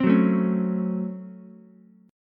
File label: C sharp Major 7th Suspended 4th Sharp 5th C sharp, Ukulele; strummed; chord; 5,6,5,4